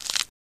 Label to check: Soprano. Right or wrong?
Wrong